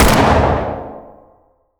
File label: Pipe Rifle Shot; FoS